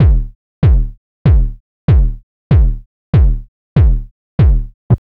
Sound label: Dance Drumloop